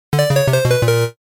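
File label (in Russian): zvuki dlia uvedomlenii na telefon v stile 8-bit, 8-bit style phone notification sounds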